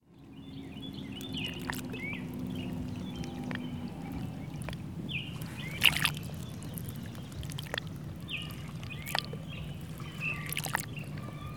nature lake ambience small gentle waves lap on shore slight background noise; people